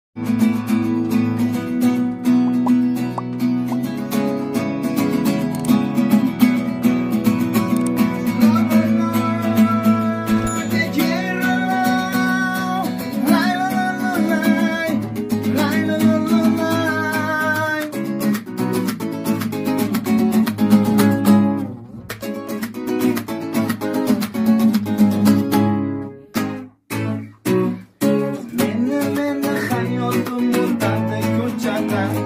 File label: guitar cover